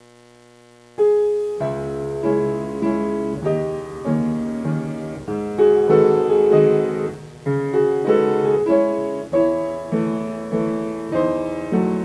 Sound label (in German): walzer, piano